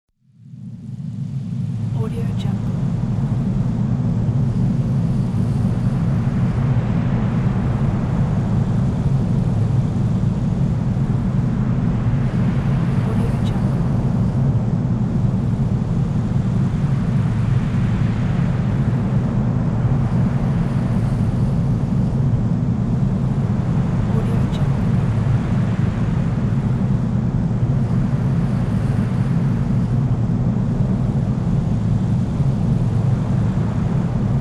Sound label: wind